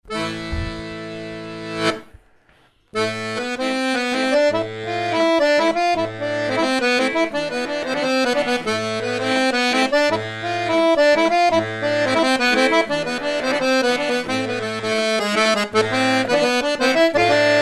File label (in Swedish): Polska från Mockfjärd; autumn Festival 2012